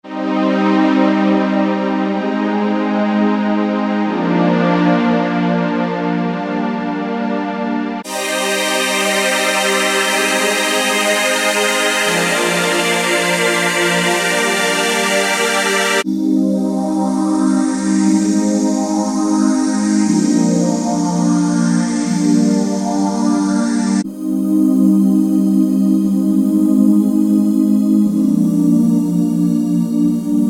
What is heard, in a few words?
Pads; PSP